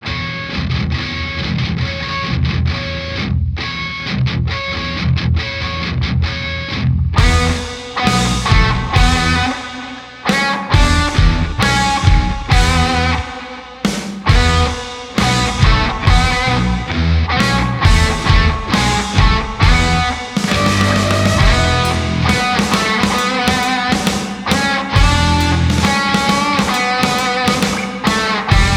Instrumental Version; Final Fantasy 9

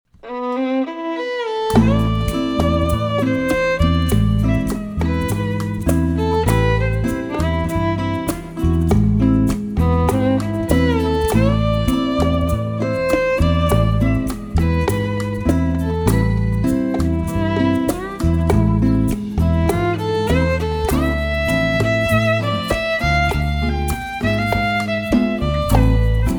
Violin; Final Fantasy Mystic Quest

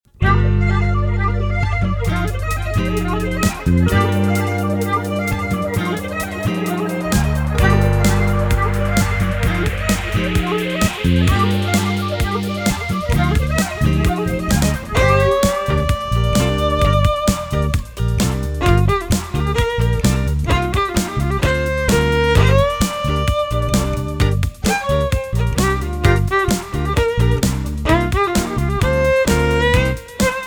Violin; Streets of Rage 2

